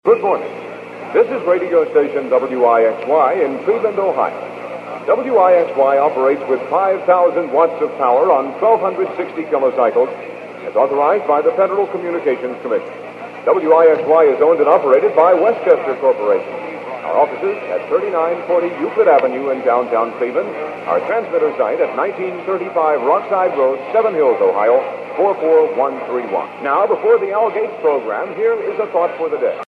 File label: sign on; WIXY